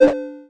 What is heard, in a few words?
KEY, Gadgets; XCOM